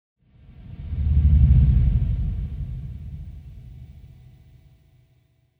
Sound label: Marimba and Drums Mood